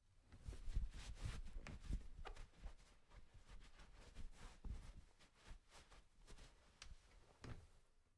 drying hands on towel

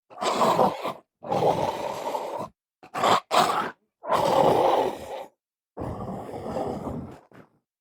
animal bark and growl

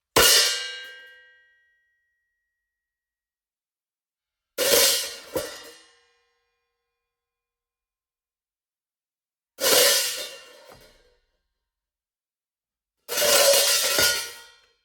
crash layer cymbals

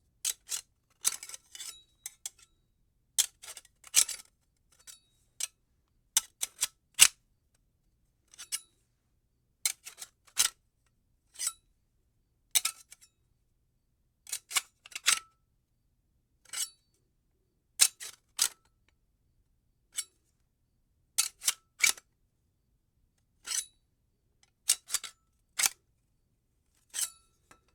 metal hand cuff

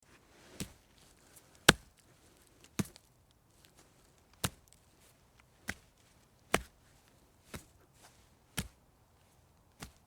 slapping stone

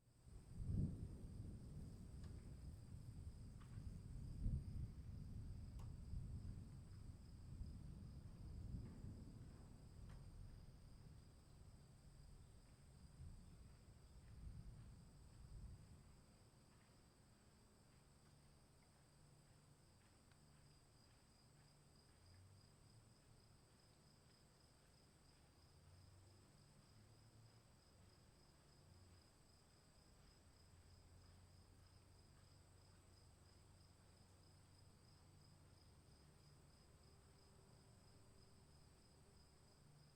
afternoon storm long

